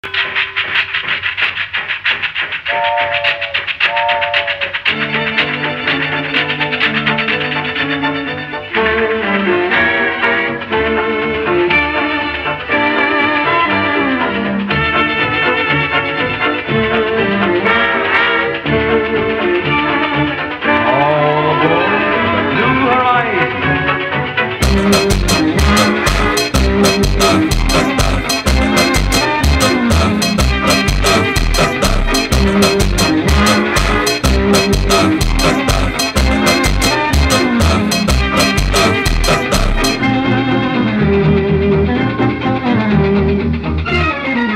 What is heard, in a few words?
funk